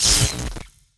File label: taunt sorcery fail; Garry's Mod; tf2